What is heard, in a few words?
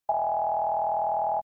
alert